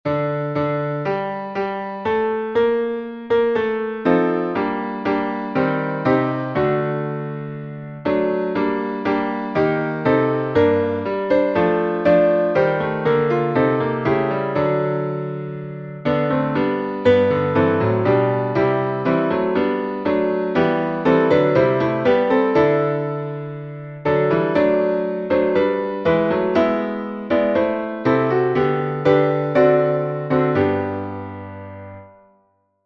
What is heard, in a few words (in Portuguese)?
salmo playback